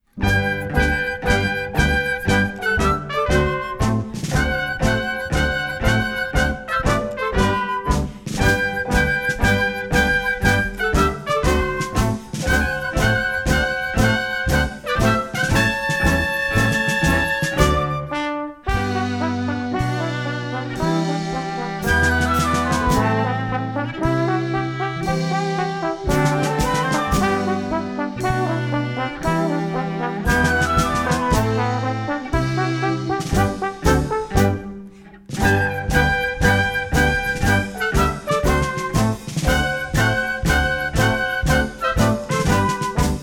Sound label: Exit Music